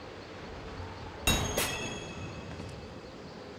ui objective

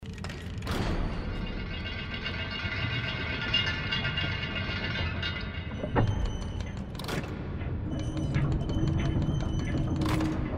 hall; Little Nightmares; gamesoundopedia

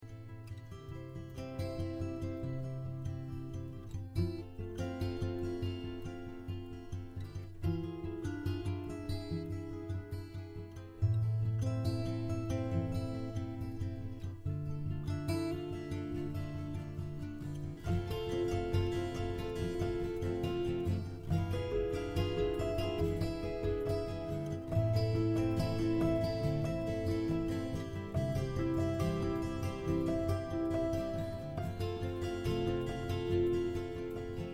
desert music; Life is Strange 2; gamesoundopedia

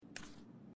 next page sound; Life is Strange 2; gamesoundopedia

